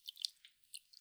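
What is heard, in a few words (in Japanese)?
くちゅ 中, 水音効果音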